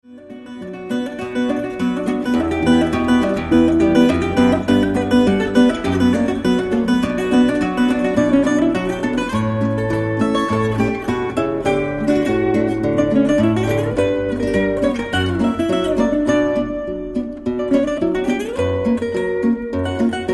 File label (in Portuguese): guitarrada; trio fado